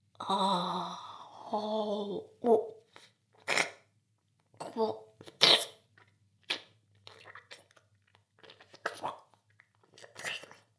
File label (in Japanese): ダウナー風俗 フェラ